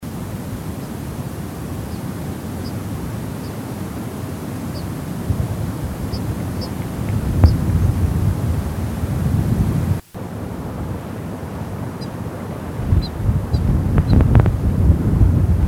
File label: white throated sierra finch